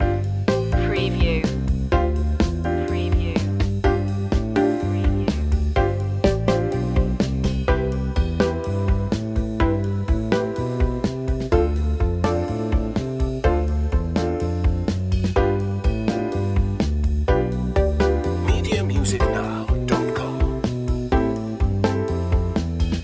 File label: carefree day out loop